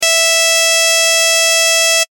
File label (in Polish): ton